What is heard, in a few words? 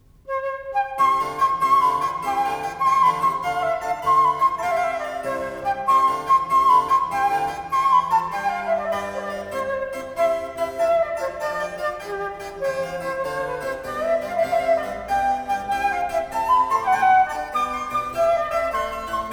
Sonate C Allegro (Giga); Vivaldi